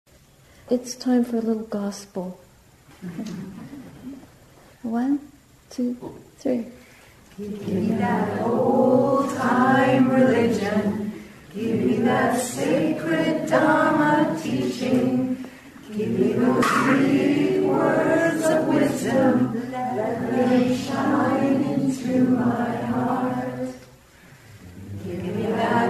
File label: A Little Gospel Chant